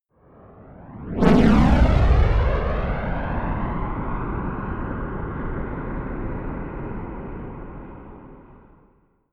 rocket large flyby whoosh fast right to left close